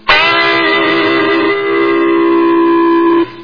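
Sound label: guitar chords rock n roll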